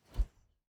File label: Fighting Woosh; SoundsCrate